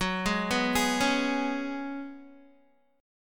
Gb Suspended 2nd Suspended 4th, Guitar; arpeggiated

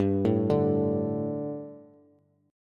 G Suspended 2nd G, down, Bass; Gsus2 arpeggiated; JGuitar

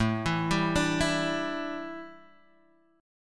A 7th Suspended 4th A Guitar Drop D, chord

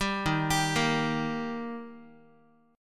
Eb Major Eb Guitar; arpeggiated; JGuitar

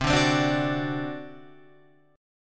C Suspended 2nd Flat 5th C down Guitar Open F; Csus2b5 strummed

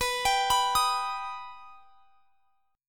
B Augmented B down; arpeggiated

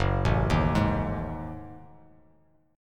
Gb Minor 6th Add 9th Gb Bass Standard; arpeggiated; 2,0,1,1; JGuitar

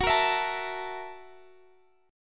F sharp 7th Sharp 9th F sharp down Guitar Open F; strummed; F#7#9

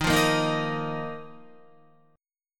Eb Suspended 2nd, Guitar; Ebsus2; strummed

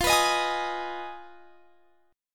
F Minor 7th Flat 5th, chord; Fm7b5; strummed; x,20,21,20,21,23

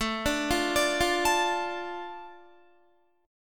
A Suspended 4th Sharp 5th A Guitar, chord; Asus4#5; arpeggiated; 4F,4A,5C,5G,5C,6E; JGuitar

